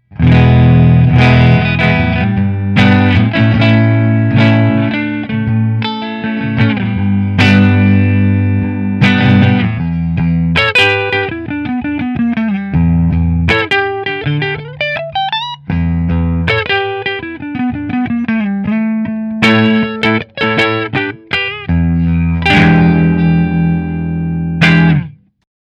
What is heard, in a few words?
Hamer Custom Neck Fender